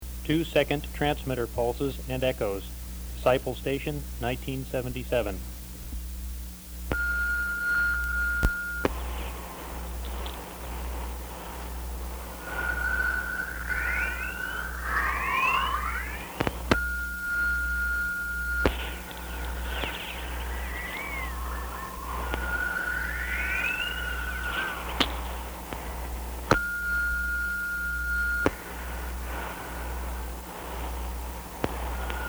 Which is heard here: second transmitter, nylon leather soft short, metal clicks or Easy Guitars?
second transmitter